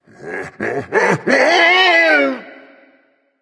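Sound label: non verbal fear, voice; cernunnos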